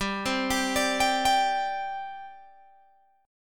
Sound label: G Suspended 4th, Guitar, Open G